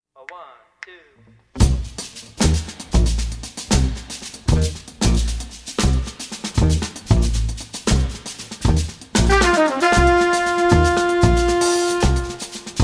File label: Shaker Funk